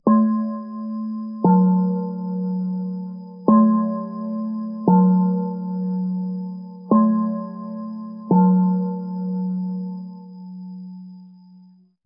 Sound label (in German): Voralarm Loeschzug